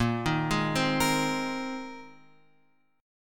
A sharp 7th Suspended 2nd Suspended 4th, Guitar, Open F; arpeggiated; JGuitar